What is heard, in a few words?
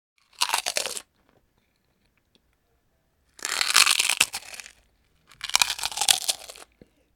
Eating potato chips mouth open crunchy